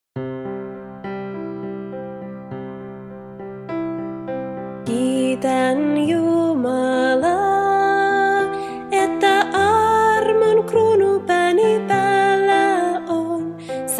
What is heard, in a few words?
Song, FI